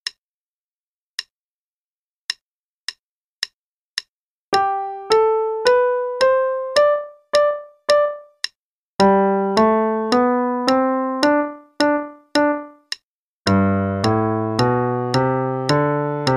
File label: Warm-Up in G, qn=108; Faber and Faber; Level 1 (2nd Ed)